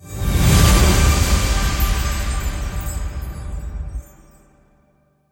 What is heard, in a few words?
big Win Start; templeofnudges